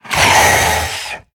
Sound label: Zombie Monster Die; Undead Collection